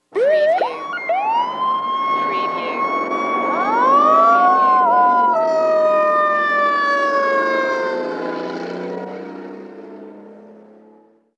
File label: Fire Engine Sirens Driving